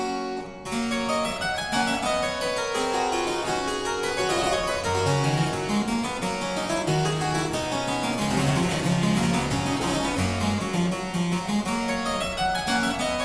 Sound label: Aria Variations in G Major, Harpsichord